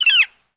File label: Flicker Chirp Single, flicker